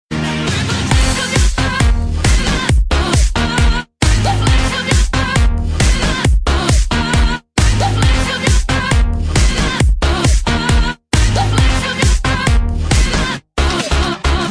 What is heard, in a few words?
Disco; Disco Superstars